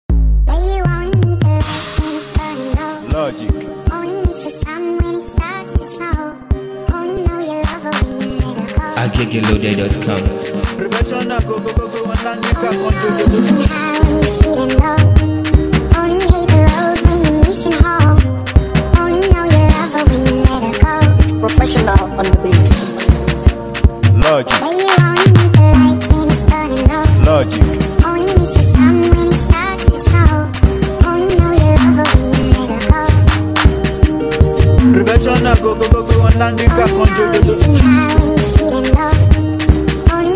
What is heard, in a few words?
Mara Beat